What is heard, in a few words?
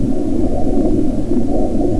pipes